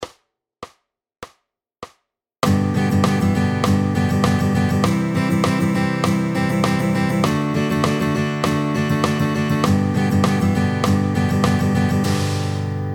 100 BPM, Hard Rock; oefening 10; naast triplets een gewild slagritme voor rock

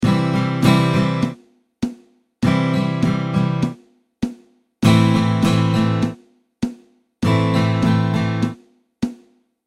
strumming pattern

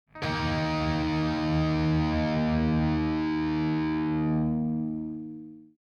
power chord

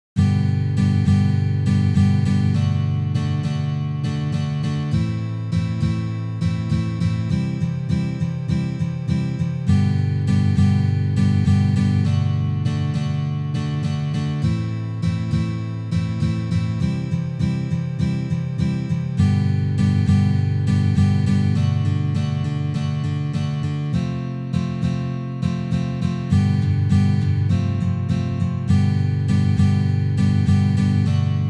strumming combi strumming 2 patterns; Strumming is het aanslaan van de snaren volgens een bepaald slagritme.; "Piano" slagritme

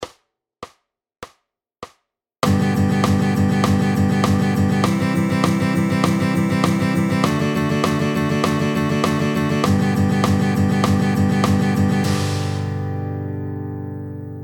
100 BPM, 16th notes; oefening 3; telling als: ene-e-en-uh twee-e-en-uh ...

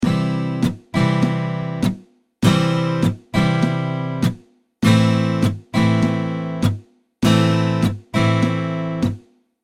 strumming pattern

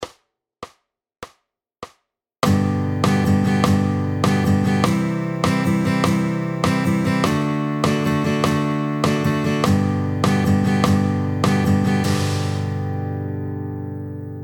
100 BPM, Triplets; oefening 9; veel gebruikt in funk, met off-beat spelen

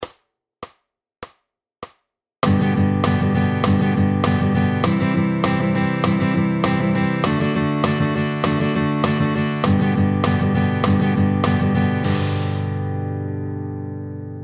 100 BPM, 16th Notes on a Beat; oefening 5; 16e noten te spelen vanaf begin maat

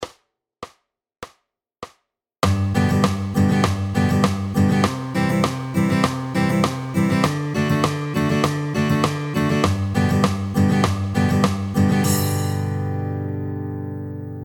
100 BPM, Single Note Downstroke; oefening 4; bij downstroke alleen laagste snaar raken

